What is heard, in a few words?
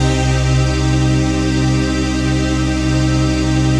PAD